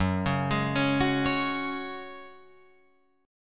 Gb Suspended 2nd Gb down Guitar Open F, Gbsus2 chord; Gbsus2 arpeggiated